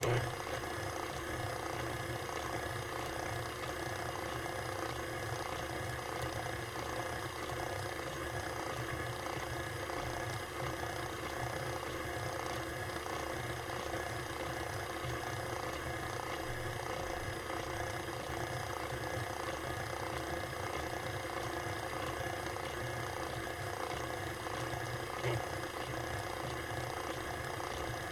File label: Standmixer, professional, multispeed, no bowl, speed 2; Eiravaein Works; Vaeyan IV